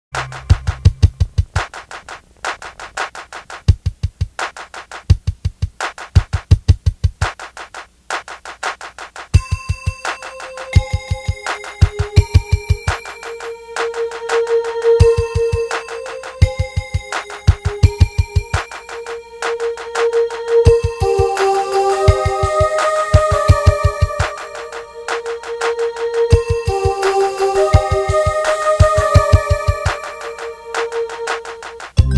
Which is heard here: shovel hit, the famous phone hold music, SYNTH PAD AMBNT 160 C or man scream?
the famous phone hold music